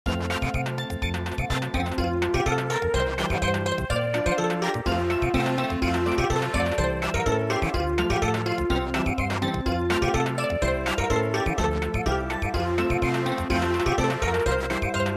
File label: Samba, midi; traditional